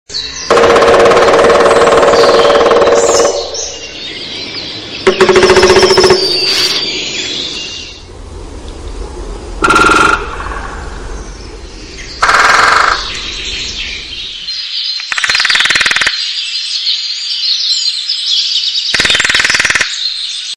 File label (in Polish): Ptaki Dzięcioł